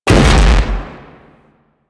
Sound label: Pistol Explosive Impact; Weapons; Sapient; Warframe